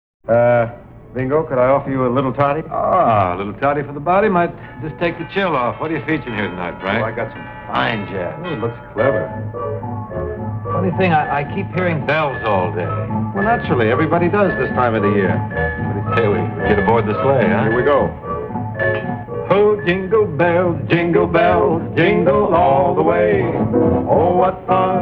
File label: Jingle Bells